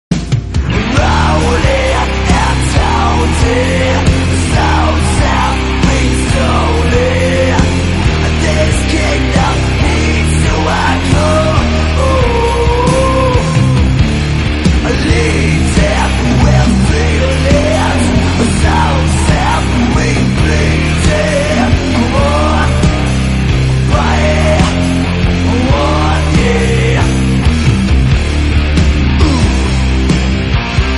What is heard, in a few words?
Rolling Samba